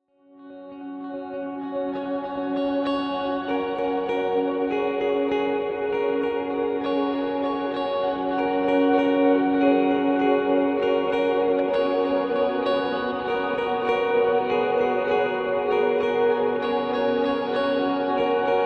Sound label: Ambient Guitars